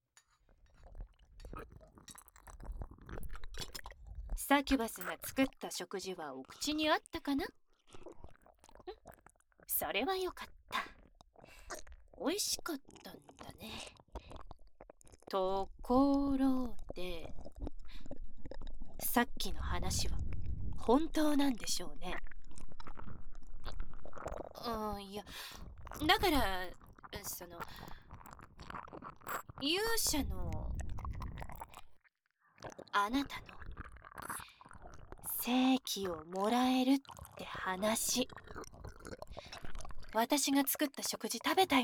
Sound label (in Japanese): 耳舐めされながらサキュバスお姉さんにディープキス・乳首舐め・フェラ・騎乗位される音声